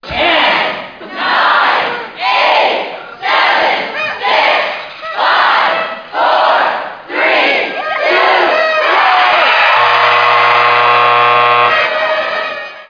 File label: Shotcloc; Brunswick Circuit Pro Bowling